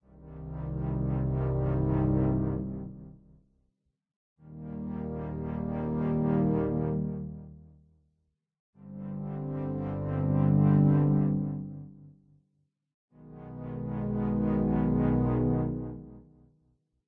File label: walking; Monster Breeder OST